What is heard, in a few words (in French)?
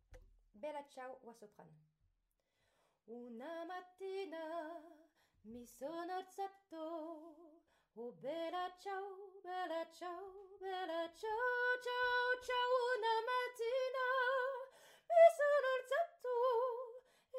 Soprane; Bella Ciao